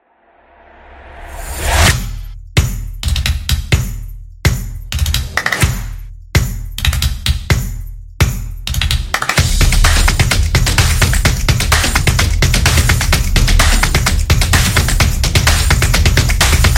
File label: Beat Background